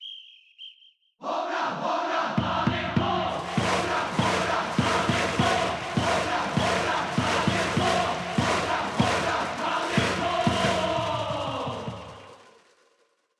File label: Music Ouen Event; ebaseball powerful pro yakyuu 2020 ps4 switch gamerip 2020